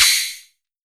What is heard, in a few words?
SCHAKEEN, PERC; NorthStar - The Wizard VOL-2; TSAR PERC